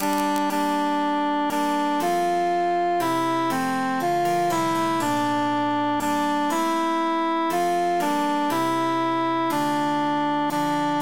altus emphasized; ach elslein

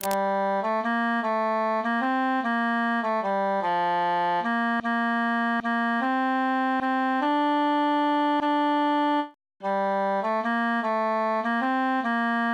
three country dances tenor solo

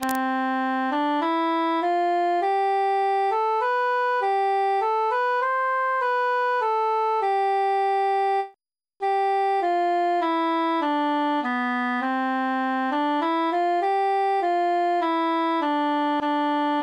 cantus solo; we be three poore mariners

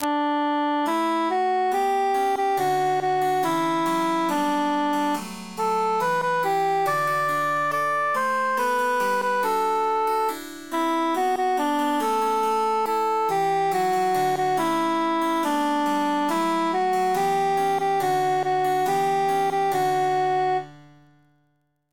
cantus emphasized; non nobis domine

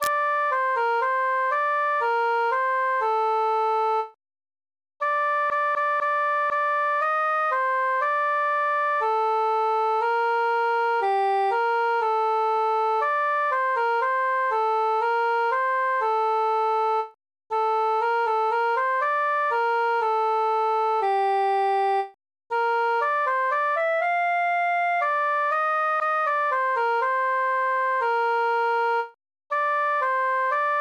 cantus solo; april

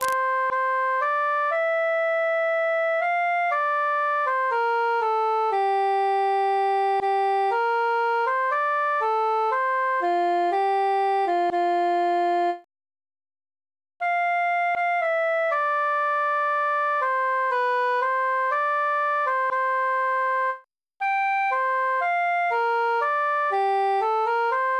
cantus solo; silver swan

